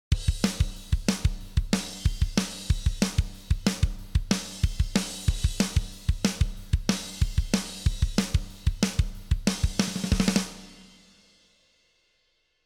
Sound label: drums off